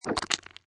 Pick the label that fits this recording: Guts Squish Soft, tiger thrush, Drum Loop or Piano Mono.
Guts Squish Soft